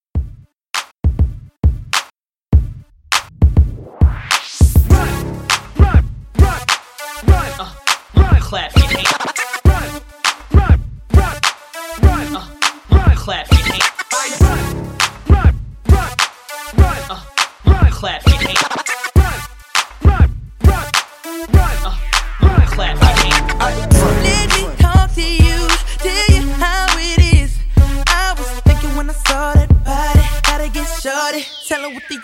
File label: Hype Scratch 101